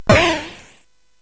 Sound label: SFX; Narco Police; hvsc